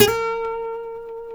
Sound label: B, Martin, guitars; Spectrasonics; Hans Zimmer Guitars VOL-1; MARTIN HAMMR